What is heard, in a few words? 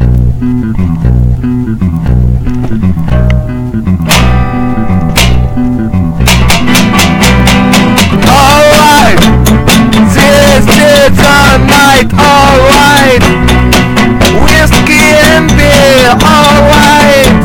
Electric Bass; Is 100 Enough